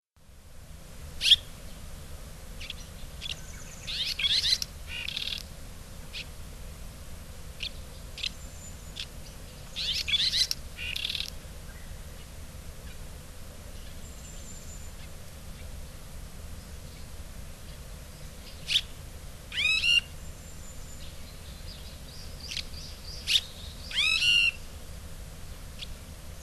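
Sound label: Red-rumped Swallow; koe; hyogo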